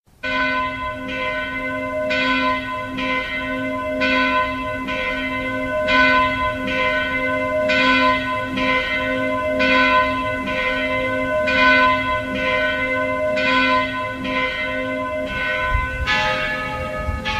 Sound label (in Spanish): sonido campanas de Iglesia; Monseñor Hugo Norberto; catedral de San Nicolas